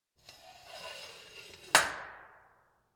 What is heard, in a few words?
prison; Inquisitor 3